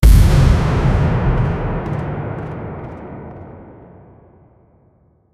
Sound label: dark agressive action